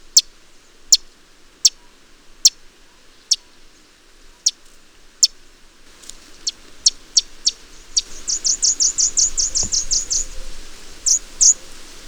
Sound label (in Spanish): obispo, "Orange Bishop" Euplectes franciscanus; Obispo Colorado; Los Colores que Cantan